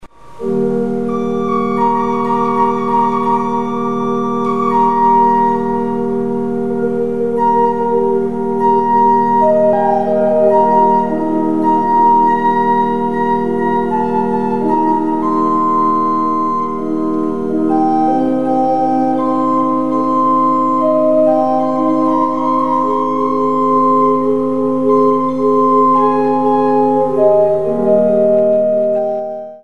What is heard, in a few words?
Micro organ